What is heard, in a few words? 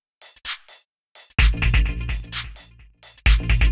Beat 128BPM (Full No Kick), techno; techno drum fx